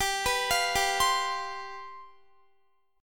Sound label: G 11th G, Guitar; arpeggiated; x,22,21,22,19,19; 4E,4A,5D,5G,5C,6F